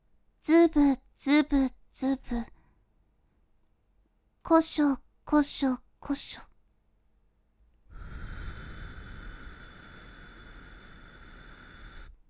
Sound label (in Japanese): 耳ふき; ずぶこしょ耳ふき